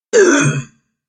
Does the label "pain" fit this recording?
Yes